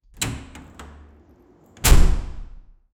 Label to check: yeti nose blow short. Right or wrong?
Wrong